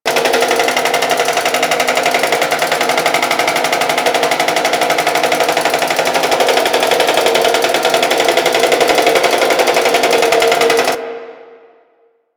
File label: Shooting Challenge Loop; Warframe; Ambience; Grineer Asteroid; Grn Asteroid Kela Arena One